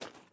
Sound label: ice step; teardown; ps5 windows xbox series xs